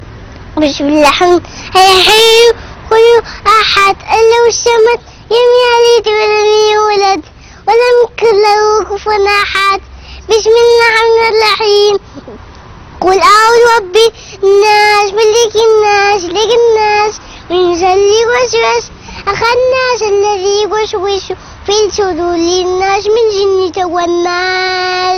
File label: cute baby, ringtones children